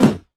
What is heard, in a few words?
Kick; Street Anthems Sound Kit